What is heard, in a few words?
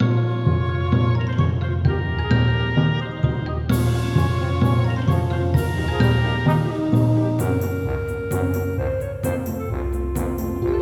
Edirol Orchestral; midi